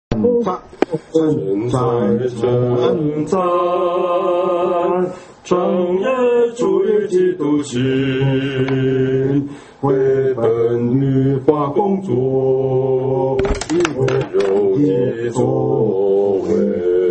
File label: hymn; worship